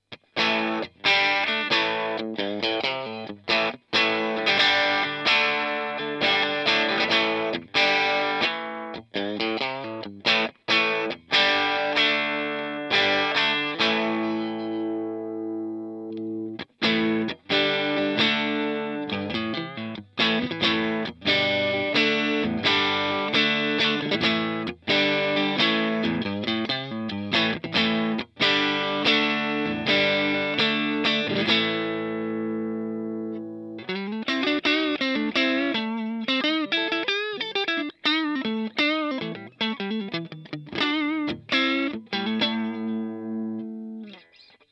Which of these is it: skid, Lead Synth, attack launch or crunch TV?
crunch TV